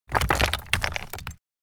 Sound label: Small rock rolling in dirt and debris